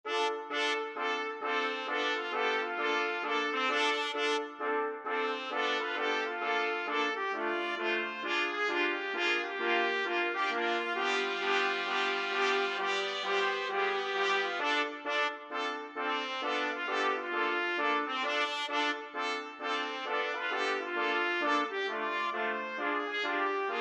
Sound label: 5 trumpet